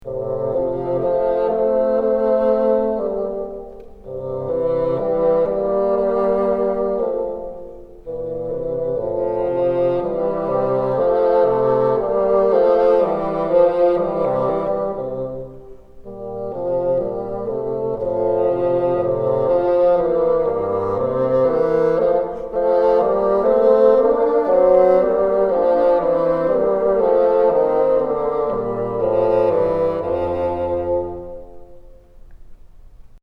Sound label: together, bassoon